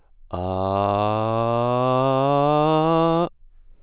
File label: aaaa; Analyse et Filtrage des Signaux Numériques